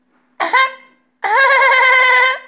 risata

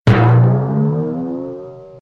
timpano

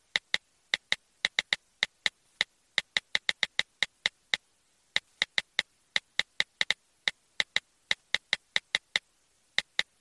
tastiera cellulare

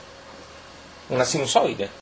sinusoide

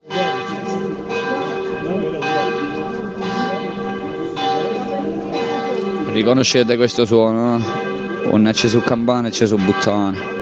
campane

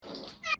scoreggia aaaa

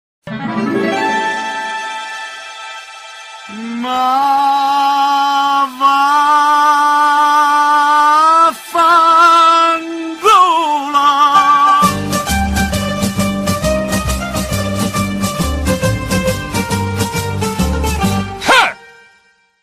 vaffanculo tarantella

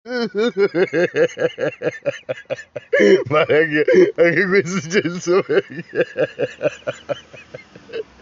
risata contagiosa

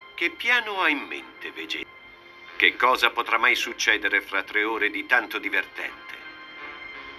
piano

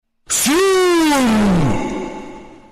urlo

